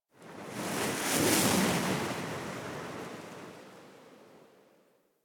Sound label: Wind Gust Large; Ambience; One Offs; Warframe; Eidolon Plains